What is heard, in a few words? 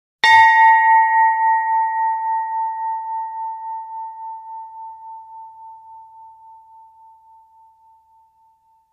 Church Bell Single, bell towers